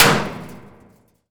bullet metal auto; physics

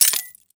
money collect; Counter Strike Model Pack; CS:GO; survival

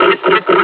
laugh, combine charger; Half Life Alyx

